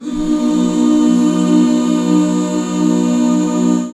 HAL B, BACK CHORDS, Roland; Sound & Vision - Gigapack II CD 1

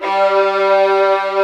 VIOLAS D2; AKAI S-Series CD-ROM Sound Library VOL-2

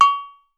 MINI HI, LATIN SET; Voice Crystal - Steve Reid Definitive Percussion

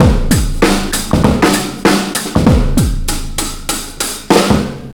97.2 bpm, Breaks; BONITA; POTBreaks

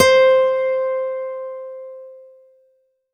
C4, CLUB ORGAN; Roland JV 2080

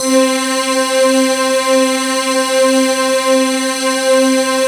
SPEC PAD DN; Analogon

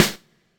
SD, dance drums hip

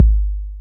TR-808 Kick

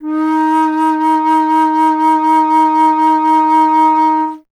Alto Flute, Solo Instruments

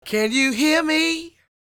SING, Vocal Planet Blues Soul BLUESY

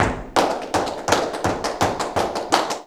JALEO TAP, Flamenco

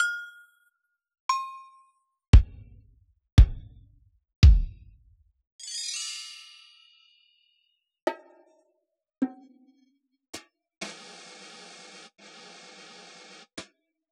Yamaha DJX Rock Kit; Sliced

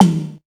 MIX TOM; AKAI S6000 CD-ROM - Volume 1

